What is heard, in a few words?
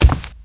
golem step